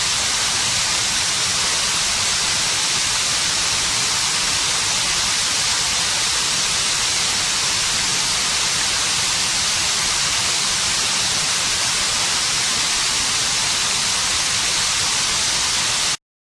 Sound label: woodpeckers